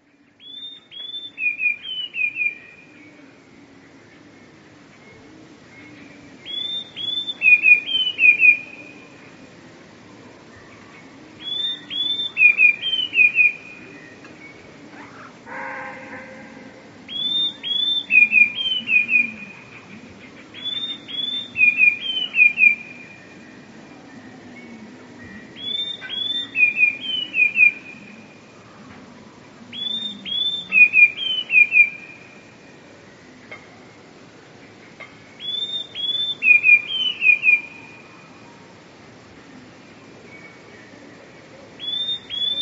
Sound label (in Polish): spiew; dwukrotnym zwolnieniu i obniżeniu o oktawę